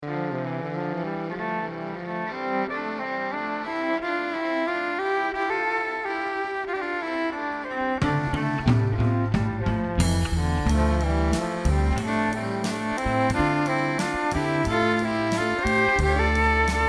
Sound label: Classroom Groove 80BPM; ClassroomGrooves